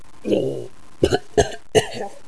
gurp; quake2; penguin

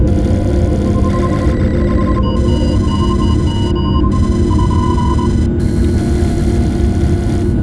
alien bridge; quake2; zepsounds

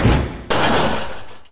jump; quake2; mobilityman

